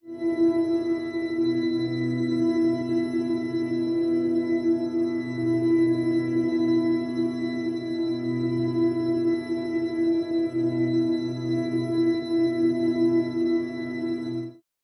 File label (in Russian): melodiya zamedlennogo vremeni